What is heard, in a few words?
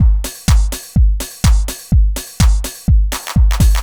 HOUSE BEATS 125